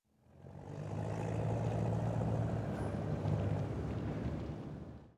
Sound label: dust car driveby; ambient atmosphere; csgo; Counter Strike Model Pack